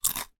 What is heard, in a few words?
human bite eating potato chip crisp